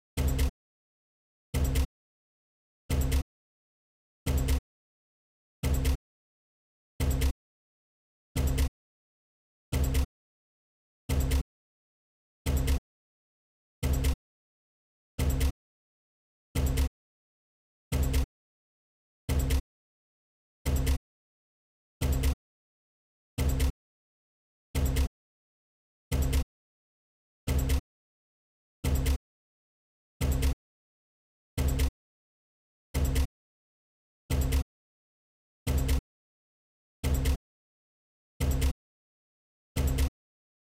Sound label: CRISTAL BOX